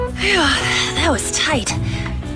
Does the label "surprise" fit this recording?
No